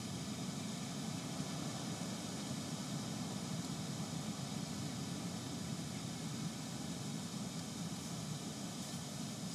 Ambience Loop, outdoor

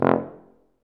F TUBA, Solo Brass, Tuba stacc

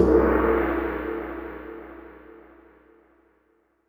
GONG, Yamaha DD-65

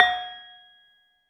LAMELS F4, Asian Traditions; USB Soundscan vol.60; AKAI; LAMELOFO

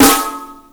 SD, Drum & Bass, 165 BPM; Ueberschall; Drum & Bass Resonance

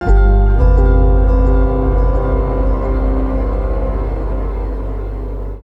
Bass FX; Basses

